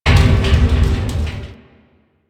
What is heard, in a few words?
Metal Barrel Slide; Warframe; Ambience; Nineteen Ninety Nine